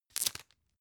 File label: tissue open